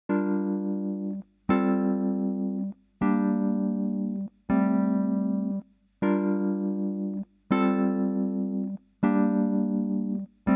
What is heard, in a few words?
Chords